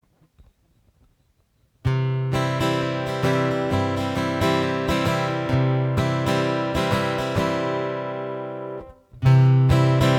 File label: accompaniment